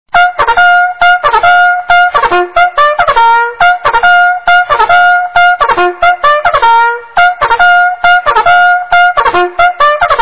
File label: adjutants, Bugle calls; American Civil War